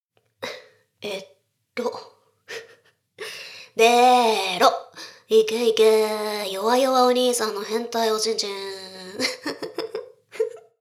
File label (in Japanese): しこしこ, ASMR; ダウナー風俗